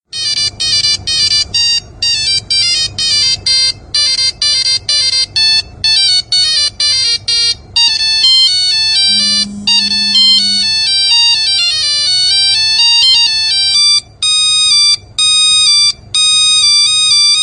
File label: song, nokia phone